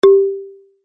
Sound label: bonk